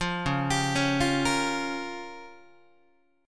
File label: C sharp 6th Flat 5th C sharp down Guitar; arpeggiated; C#6b5; JGuitar